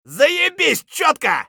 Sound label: scav cheer; eft